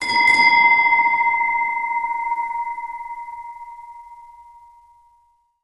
Error Message; Custom UI Sounds; Bloodborne

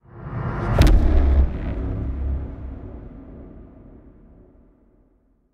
Quest Started; Custom UI Sounds; Insurgency Sandstorm

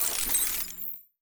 Backpack Close; Custom UI Sounds; Call of Duty Advanced Warfare

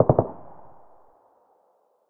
ak74 burst short; weapons; SPT Battle Ambience

